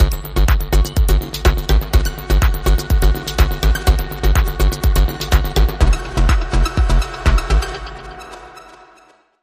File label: Link Sting